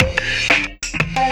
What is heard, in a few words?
Orbish Funk, Synchro Funk, Beats; Sonic Foundry (Sony Creative Software)